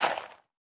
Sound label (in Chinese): 堆肥桶填充声